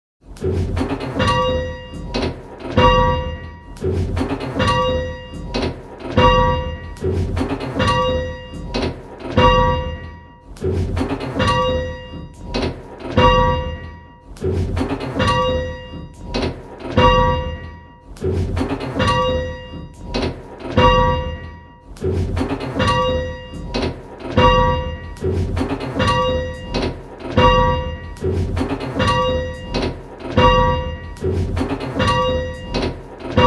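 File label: bells, 8 Bell Games; Just One Bell; Pebworth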